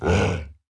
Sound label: orc hit